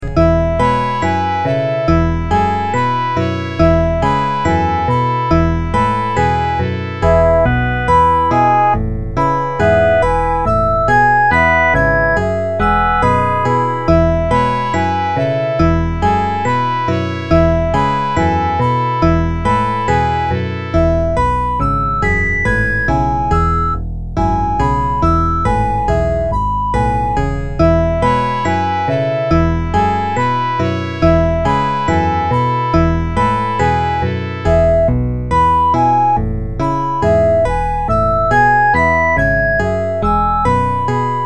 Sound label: youthful elf seeking adventure